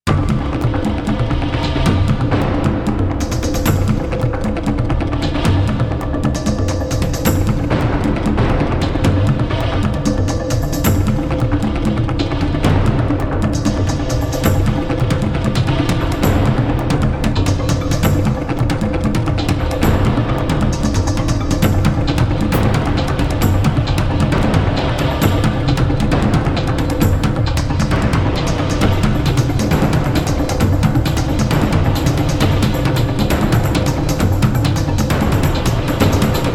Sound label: World Drums